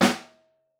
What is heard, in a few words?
SN Room, Snare; Ableton Foundation FreePacks; Snare Designer